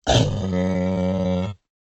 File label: dinosaur groan; SoundsCrate